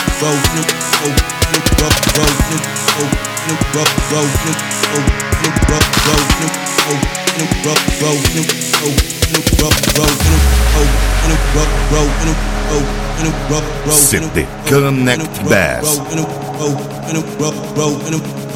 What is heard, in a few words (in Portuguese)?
bass house e rap